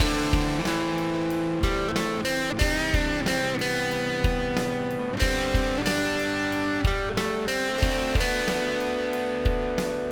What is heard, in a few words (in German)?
Donner Extrem Drive Wet, gain, backlead; Gitarre